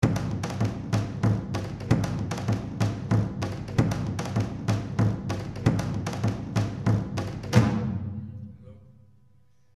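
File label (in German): daouli, Trommeln aus Griechenland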